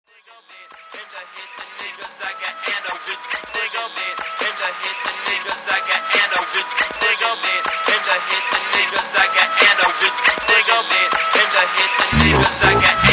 PHONK

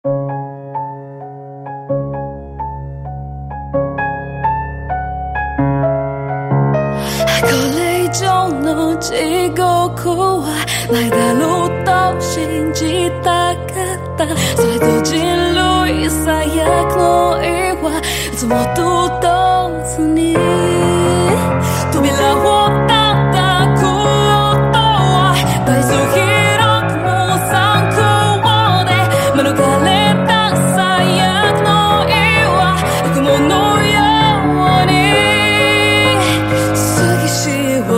acoustic

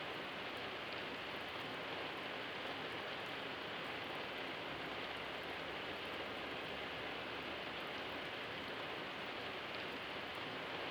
Arabian Gulf Rain; Luke Mccann; ASMR

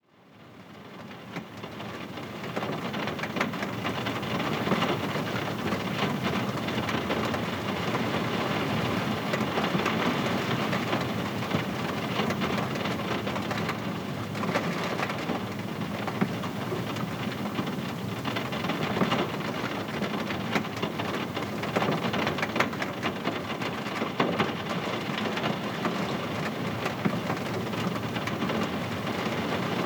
Ambient Rain Sounds

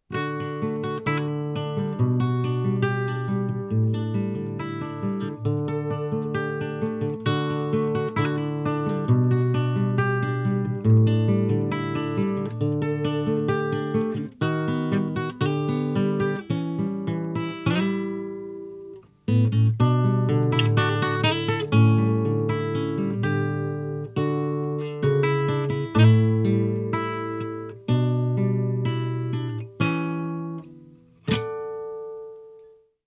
Instrumental

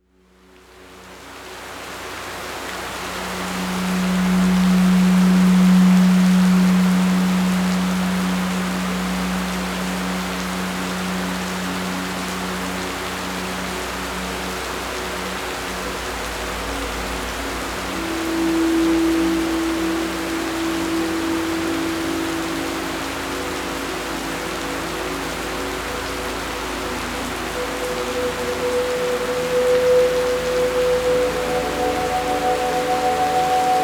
Garden Rains; Nature Soundscape

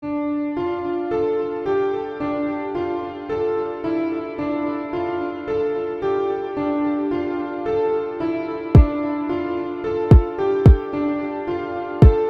Bass 33GHz 110Bpm New Shcool

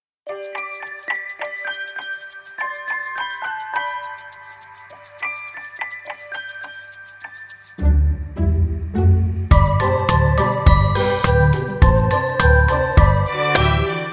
Approaching Bells; Marco Grasso; Matteo Minchillo

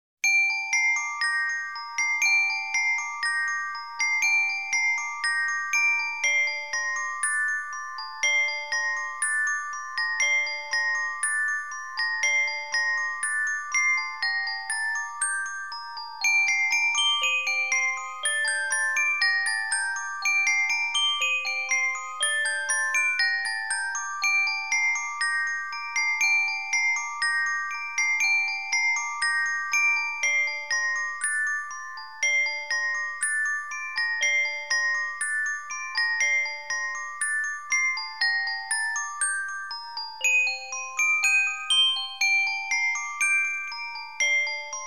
Music Box

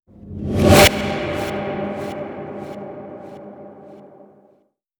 Fast Transition

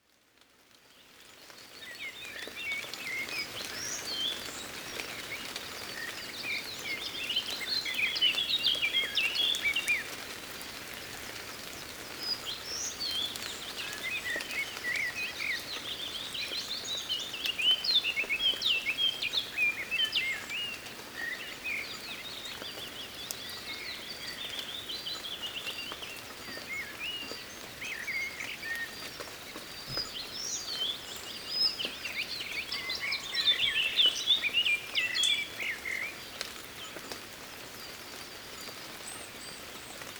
4am Rain Forest Birds

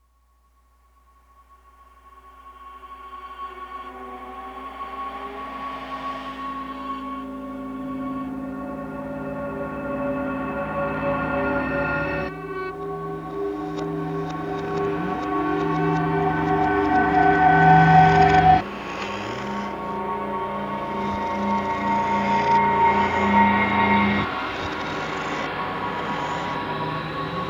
Haunted House Music

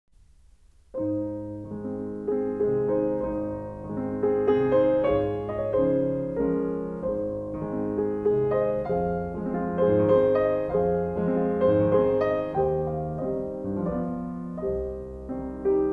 Waltz in Ab Major

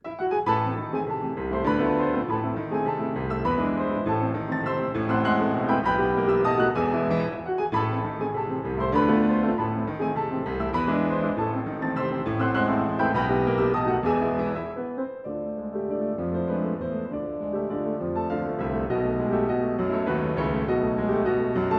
Piano Duo

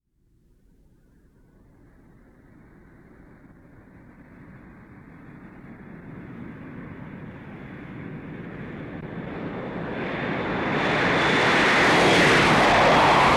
707 Jet Taking Off; Sound Effect Studio

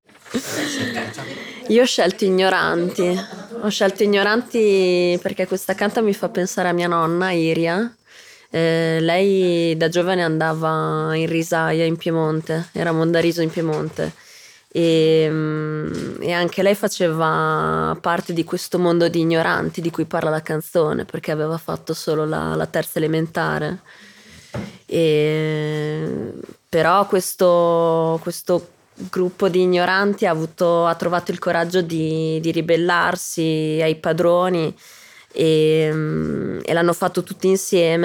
Coro delle Mondine di Bentivoglio

